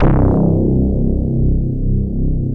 DX7II BOP; Best Service; Alpine Volksmusik; BRASS+BASS

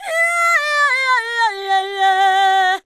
Female Vocal 100bpm G Dry, African Voices; Quazibeat; African Secret

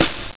Crushed Perc, bitcrushed; musicradar

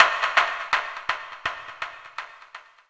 echo, Drum N Bass; Sonic Foundry (Sony Creative Software); Knutrix Drum & Bass

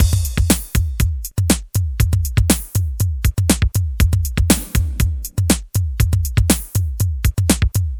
Cold Funk Beat, Composite Beats; Sonic Foundry (Sony Creative Software); Myths of Technology

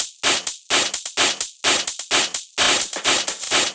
Loops 128BPM, Drumloops

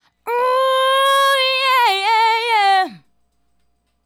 Female Solo, Hip-Hop and RnB Vocals

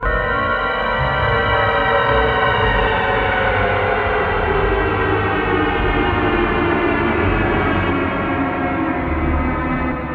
Orchestral Collapse G3; Zero-G - Ghost In The Machine

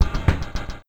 Criket Sft, Cricket

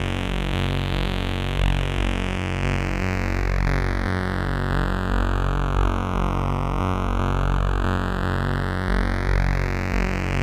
Synth Ultra Bass

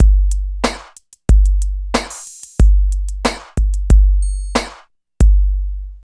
127 LOOP; East West - Phat & Phunky CD2; 90's Sample CDs

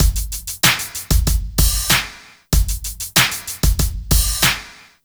Club Tip, R&B Drums

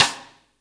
STICK, Drum 1 Dry; East Collexion

